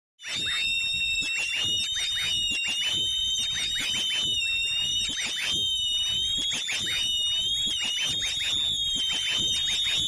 Synth Alien Screams

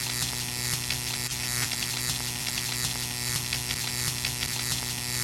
Neon Buzz Sizzle; buzz & hums

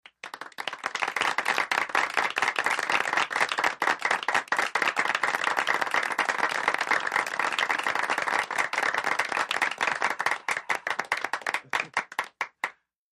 20 People Clap

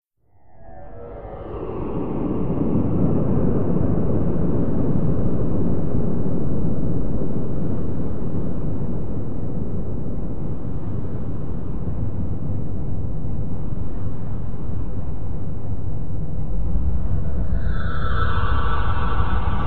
Hollow Tunnel Amb

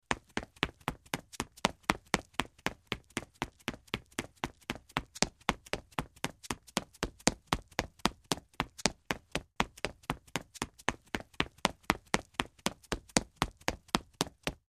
Footsteps Concrete

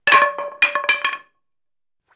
Baseball Bat Wood Dr; baseball fx & games

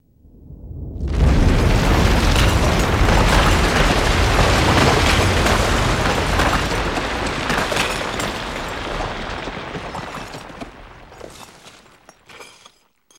Avalanche High Rumbl

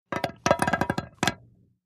Pouring potatoes into an empty pot.

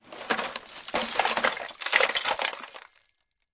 Junk Debris

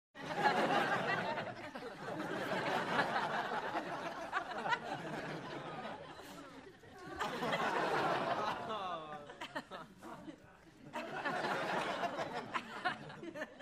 Crowd 100 Subdued; medium int

